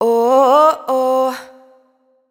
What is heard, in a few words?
adlib Oh Woah wet, vocals; Slate Digital Omnivox Vocal Sample Pack; Adlibs